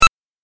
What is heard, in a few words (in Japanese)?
8bit 選択